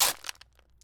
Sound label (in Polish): Lopata